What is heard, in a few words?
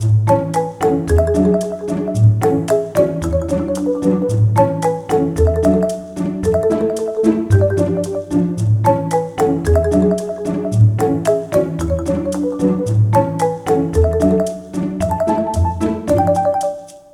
Cutscene BGM; Cookie Run Kingdom